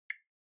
Smartphone Typing Speaker Single

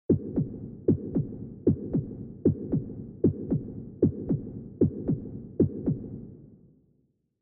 Heartbeat Fast Reverb

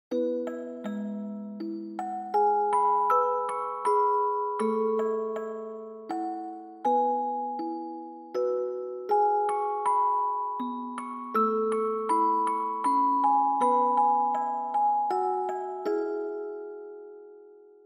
Reverb Slow; Akatonbo

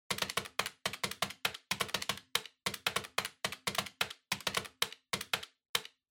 Calculator (Desk Pattern)

